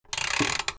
cranking

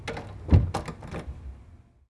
door closing

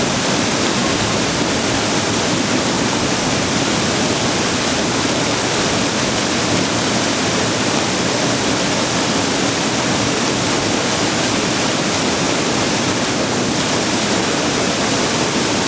water stream

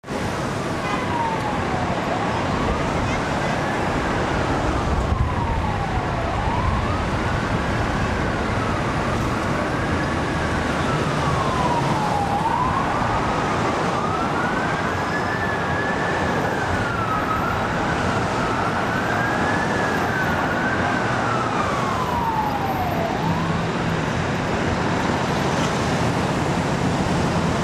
traffic

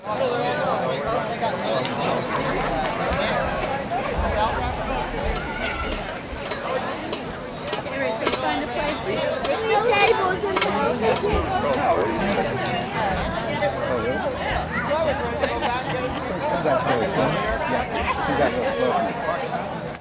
crowd outside

